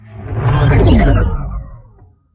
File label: sci fi whoosh